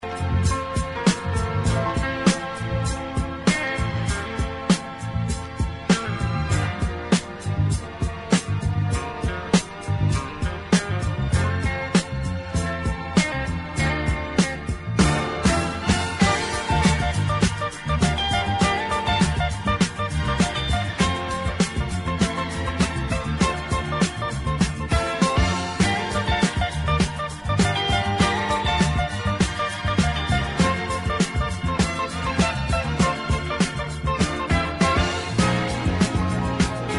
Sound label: disco